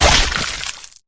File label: flesh destroyed medium; flood